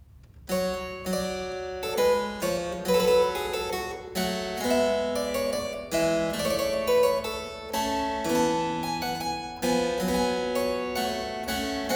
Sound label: Allemande in G minor, Keyboard Works; J.S. Bach; BWV 836; Johann Sebastian Bach; Inventionen & Sinfonias